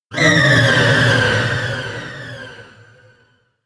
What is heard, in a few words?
whale death cry; anemoi